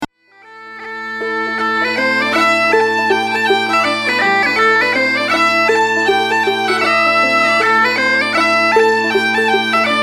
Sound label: British Field March